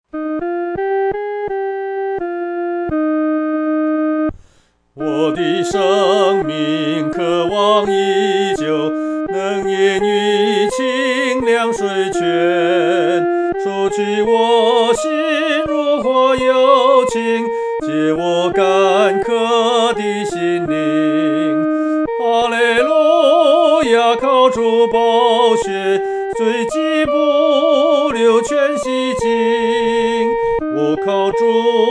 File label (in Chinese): 独唱 第一声